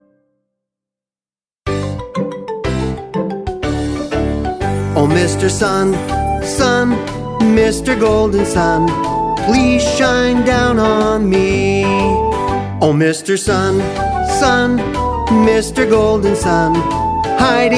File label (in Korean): 영어남 동요